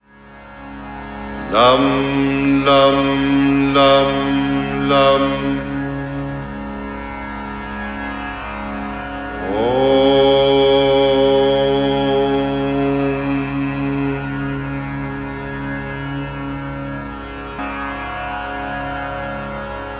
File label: Sanskrit Bija Mantra and OM